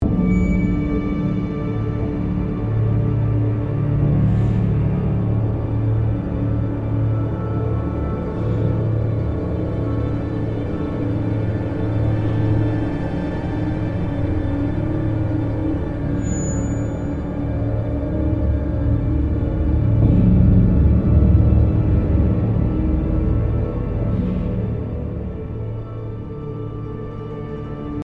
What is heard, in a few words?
Tense (Loop)